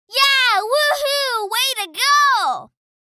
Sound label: Little girl excited vocalization